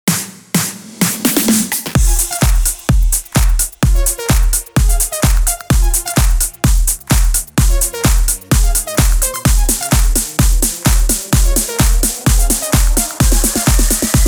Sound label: slap house remix